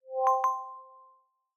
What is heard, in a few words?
Webcam Start; homepod OS